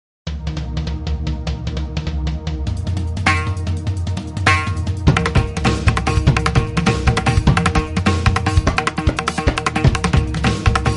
aadiwasi dhol instrumental bgm

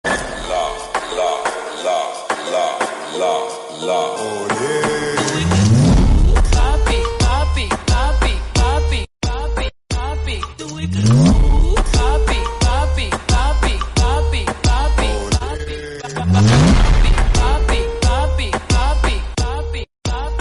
slowed reverb bgm

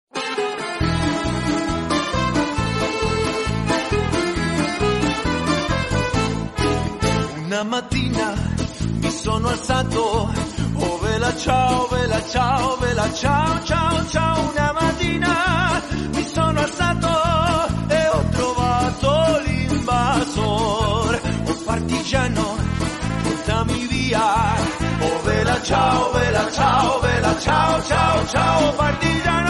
italian song

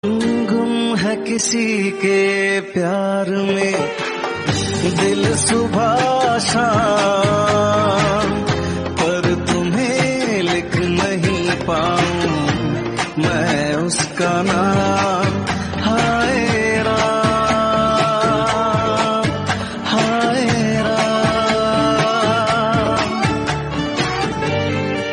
title track hindi

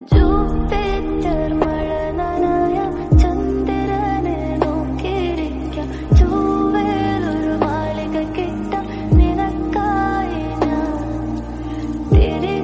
slowed reverb

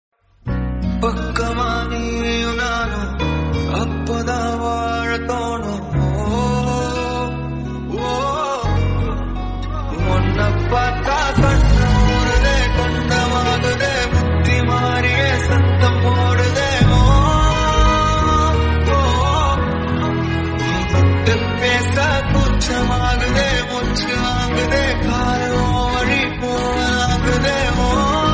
bgm slowed

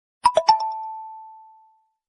xiaomi message notification

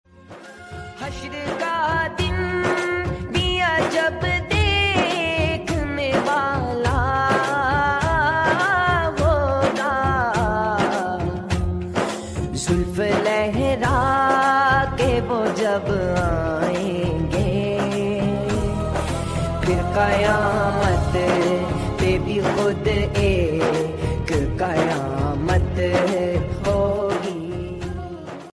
naat, Islamic

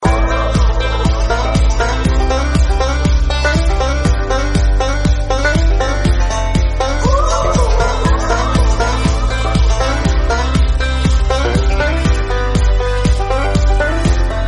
banjo dhun instrumental

